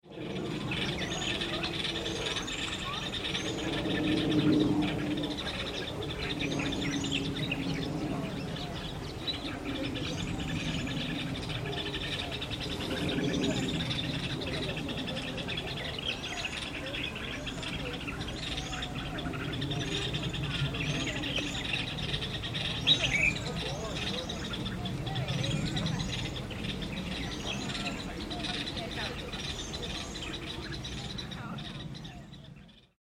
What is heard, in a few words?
birdsongs